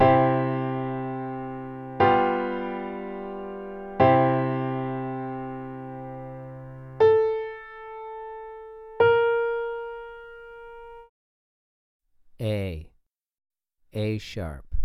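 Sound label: 2 Note Melodic Major a3 a#3, piano; ear training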